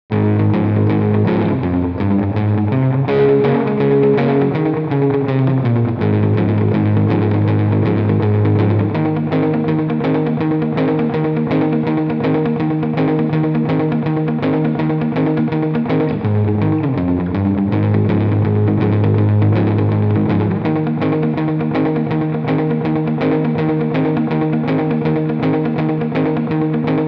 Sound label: Rhythm Guitar